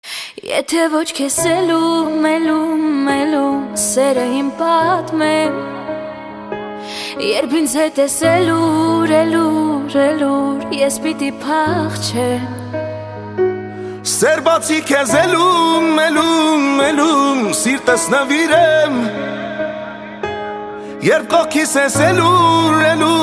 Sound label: acoustic version